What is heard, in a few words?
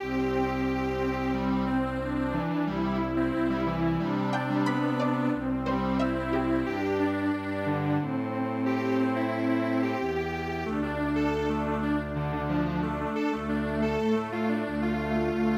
moonlit night Strings Quartet